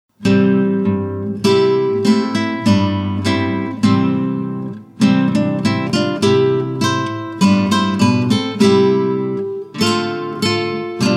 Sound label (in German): Gitarren; klassisch; 2 gitarren